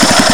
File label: mower idle